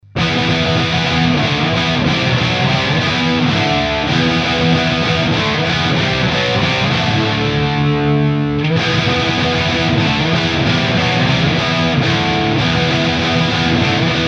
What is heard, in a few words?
Custom 3 Canal Plexi OCD, Charvel SOCAL